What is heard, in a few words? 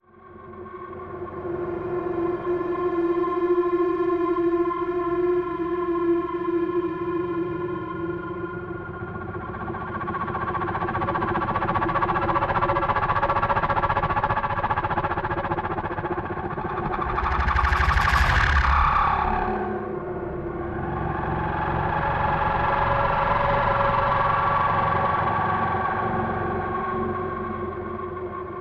NEON AMBIENCE LOW; Into The Void; boom library